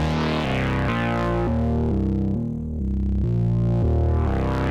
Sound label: Bass; simple session